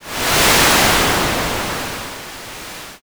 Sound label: wind